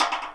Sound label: REPINIQUE, 3-D Percussives, Ethnics; E-MU Producer Series Vol. 5 – 3-D Audio Collection